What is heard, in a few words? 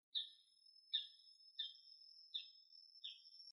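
bull-headed shrike call; mozu nakigoe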